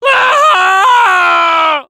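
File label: pain critical death, fr; Spy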